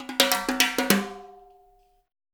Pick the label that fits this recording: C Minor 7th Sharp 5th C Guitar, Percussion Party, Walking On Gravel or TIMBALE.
TIMBALE